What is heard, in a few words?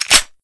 usp mag in, weapons